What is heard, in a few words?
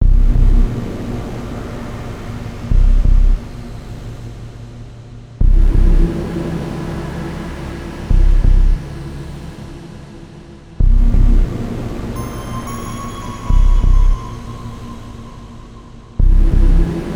Ambient Music Track Title Theme